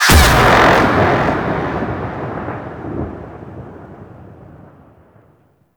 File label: Beam Fire; Gameplay; Mission One; Condrix; Warframe; The New War